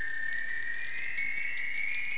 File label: ethereal start; Giza